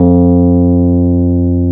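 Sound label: Piano Rhodes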